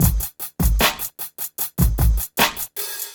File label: PHATT BEA